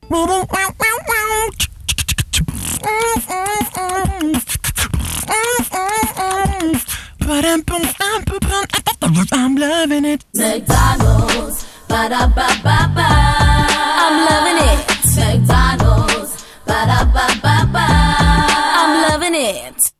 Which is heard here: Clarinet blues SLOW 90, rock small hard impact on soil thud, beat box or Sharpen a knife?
beat box